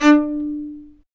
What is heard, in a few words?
STR, Vc Marcato; Low Marcato; Roland L-CD702; Combos 1